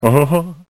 unhuhhuh

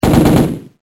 minigun

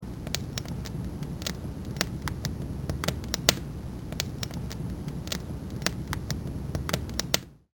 fire

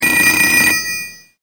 PHONE

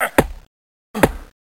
WOOD CUT

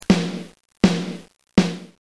Snare Drum

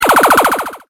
LASERGUN

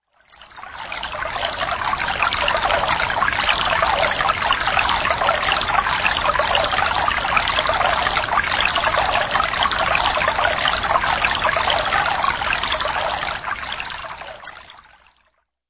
Stream Running Water

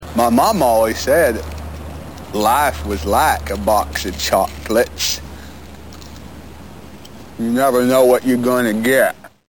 box of choco

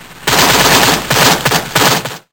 GUNFIRE

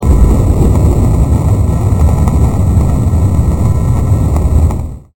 ship

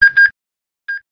register scan

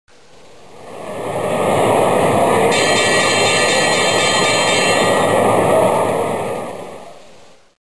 BELL

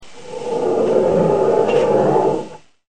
ROLLER

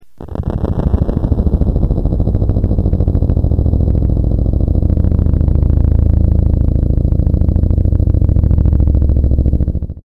copter

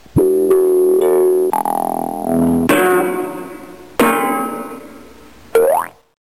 Bong Crash; Mono

